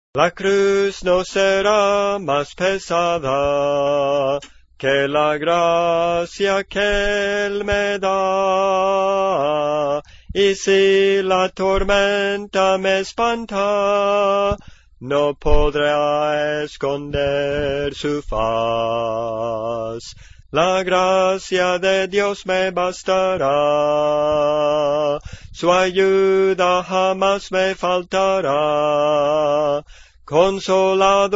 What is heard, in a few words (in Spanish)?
Contralto, Cantos Espirituales